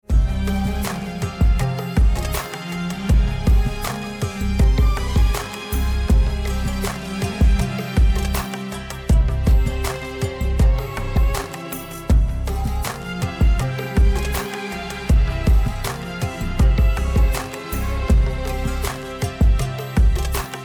eastern background music for short video dance hip hop beat